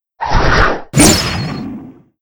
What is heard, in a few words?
Siren Wave Skill